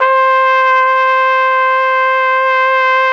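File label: flugelhorn C; BRASS; Best Service; Alpine Volksmusik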